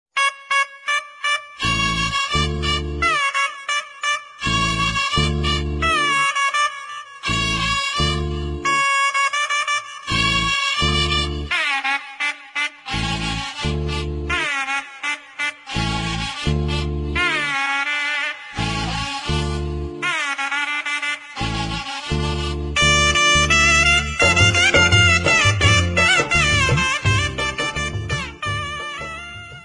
Tamil Music

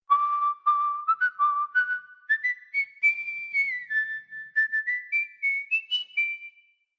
Whistle Tone

